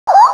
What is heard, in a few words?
crossbills flight